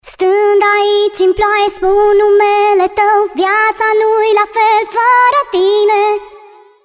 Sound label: Rain